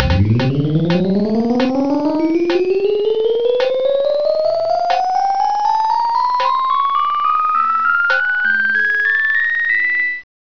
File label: Ocean